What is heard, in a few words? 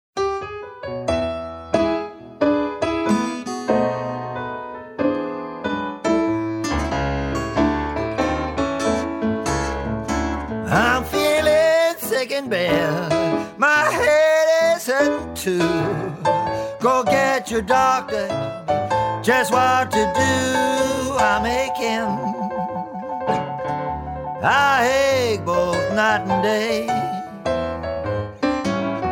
pneumonia blues